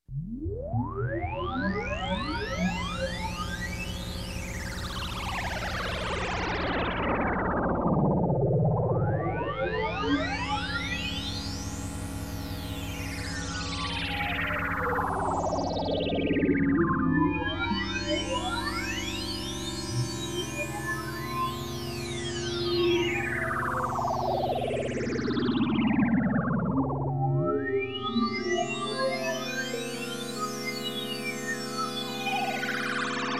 Space Mystery Tune, Electronic, Music; Sound Ideas 2000 Series

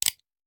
Hand Gun Foley; Sound Ideas; Guns

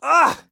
police hit bad short; donnie; Blind Drive

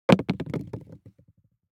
DICE on Glass, Throw and Roll, Foam, Two Dice; Samples; ArticulatedSounds; DICE Sfx Party (Extended)

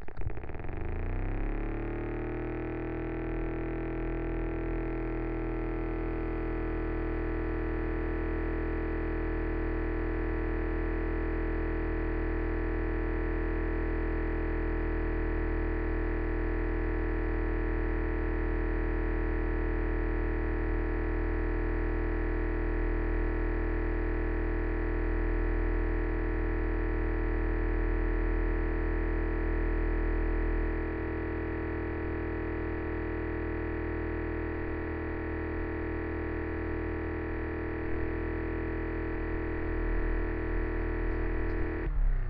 minifan; devices accessories utilities; NS Studios - ultra sound collection

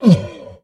MONSTER Grunt Deep Hurt; MONSTERS & CREATURES; mono; Universal Sound FX

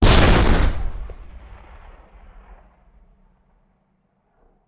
Cannon Tank; Sound Ideas; Guns

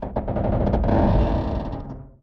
Creak Large Metal Ship; SFX Kit

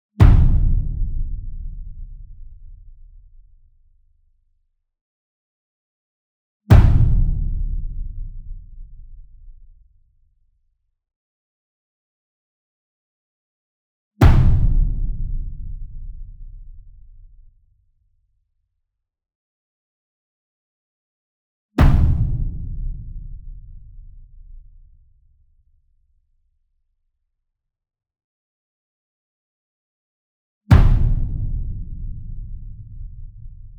SINGLE DRUM Verdi 66 Inch Hit Soft Beater Near; boom library; Cinematic Strikes Construction Kit

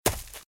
Footsteps - Leaves - Run - Right; RadwinIsland

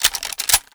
Gun Weapon Pickup; SFX Kit; Pickups

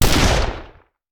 Rifle; Sound Ideas; Guns

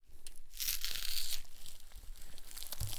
Metal Tools

